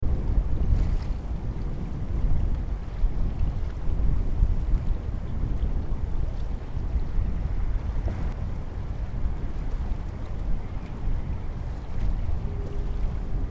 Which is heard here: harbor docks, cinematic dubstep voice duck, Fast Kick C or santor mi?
harbor docks